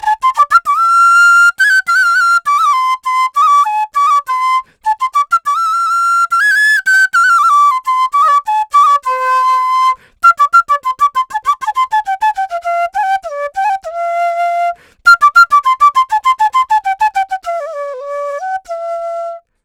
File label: Flute Loop F Maj 100 BPM; Gully Loops; waves of bihu vol 1